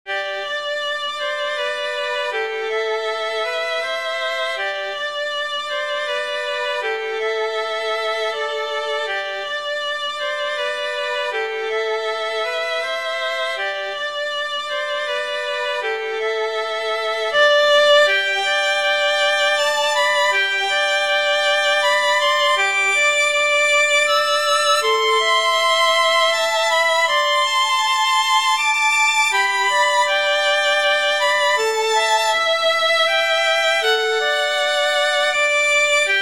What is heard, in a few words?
duet two violins